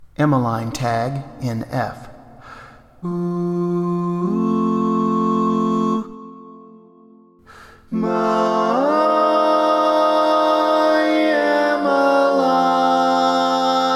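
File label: Baritone, barbershop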